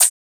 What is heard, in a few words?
Closed Hat; FLPRO